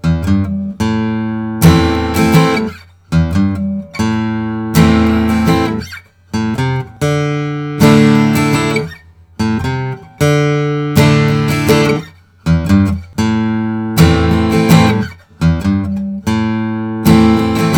Kopp AJ Brazilian; vintage sunburst

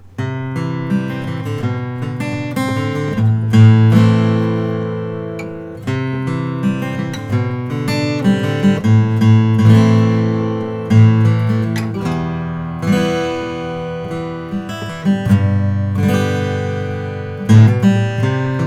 santa cruz 000 12 fret sitka natural

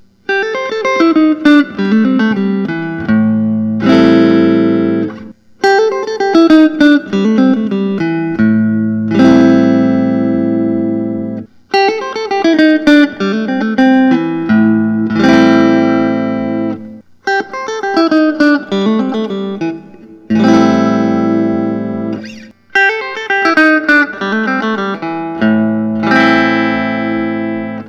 gil yaron 62 s style

